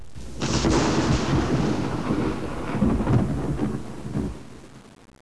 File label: storm